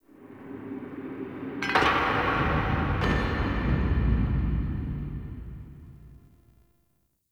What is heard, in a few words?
Warrior Echo, Crypt Ambience; One Offs; Champion Crypt